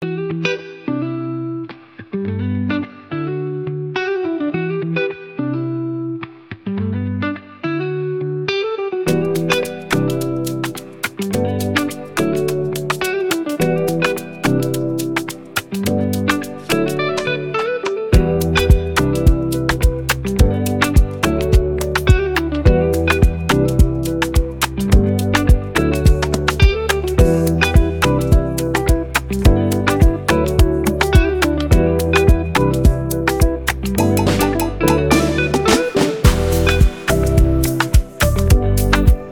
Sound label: BEAT, afropop